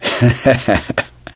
laughter, gambler, survivor voice; source engine; left 4 dead 2

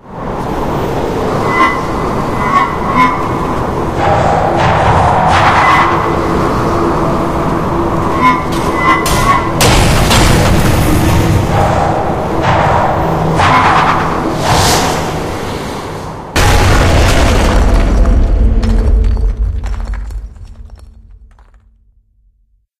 incinerator fall; Source Engine; Portal 2

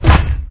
dog footstep walk; npc; source engine; episodic

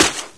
near foot heavy, antlion guard; npc; source engine; episodic

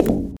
glass, footsteps, walk; source engine; left 4 dead 2

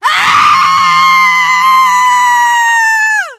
fall, teengirl; source engine; left 4 dead 2

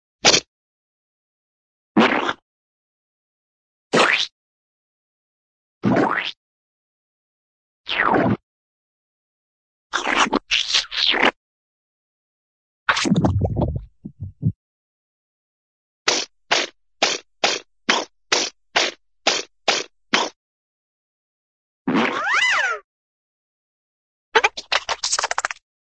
squish, cartoon squidge

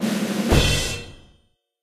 trade success

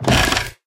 plywood, footsteps

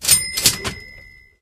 bought upgrade; video games; source engine; tf; mvm

